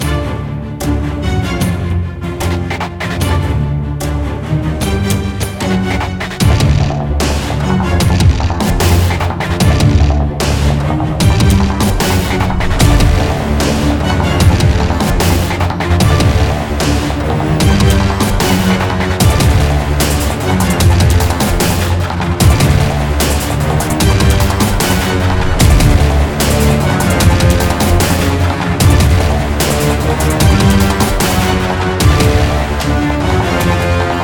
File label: Epic Battle Looped